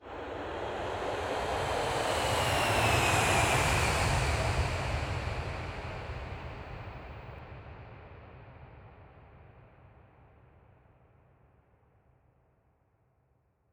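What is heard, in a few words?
Jetsetter A, sound effect eurodance; евродэнс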